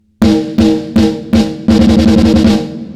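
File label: snare preset Rock Snare Thick with Weight; Joe Chiccarelli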